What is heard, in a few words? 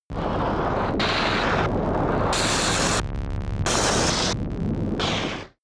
scatter vent buzz